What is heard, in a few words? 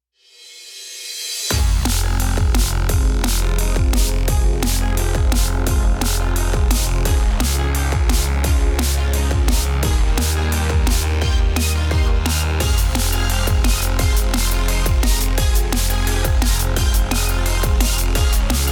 Heavy Dnb; Cyber Hook